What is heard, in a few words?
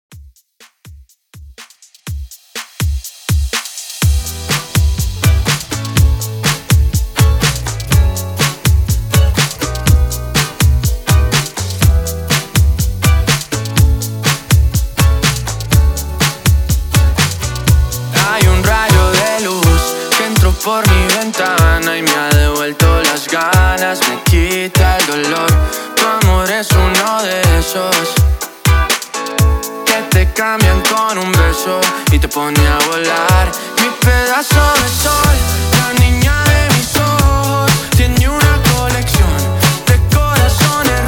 latin, 123 bpm; REDRUM